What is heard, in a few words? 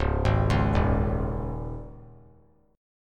C sharp Suspended 2nd, C sharp; C#sus2; arpeggiated; JGuitar